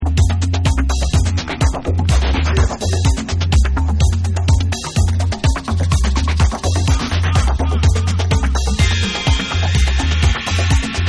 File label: BEAT